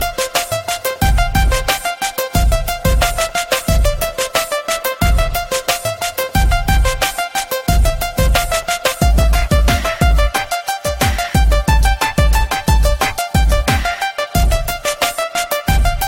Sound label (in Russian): fragment dlya budilnika alarm tone